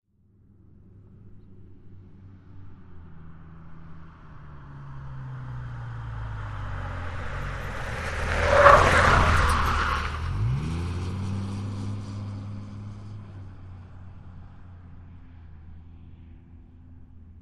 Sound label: Truck With V-10 Motor Corner By With Light Cornering Skid, Dodge Ram